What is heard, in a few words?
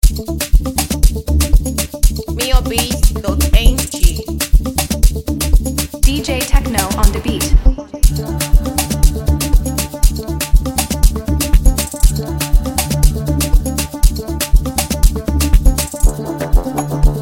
Dirty December Beat